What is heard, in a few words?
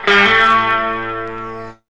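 GTRRIF, guitar riff; E-Lab; X Static Goldmine 1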